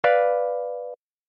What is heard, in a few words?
Bdim chord